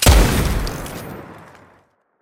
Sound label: shoot; EMXArms; emx draftsman